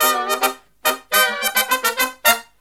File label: Brass Riffs; USB Soundscan vol.29; AKAI